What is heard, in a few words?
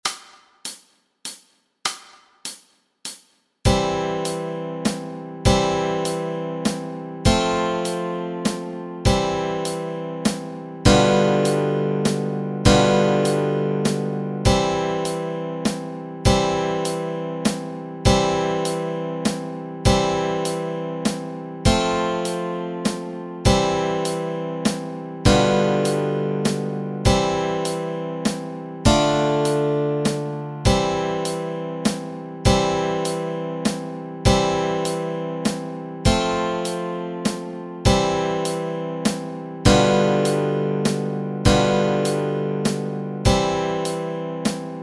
chords, Easy Guitar and Ukulele